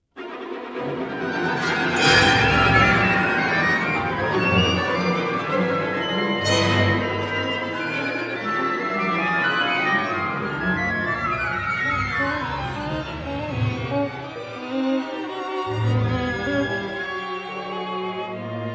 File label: violin concerto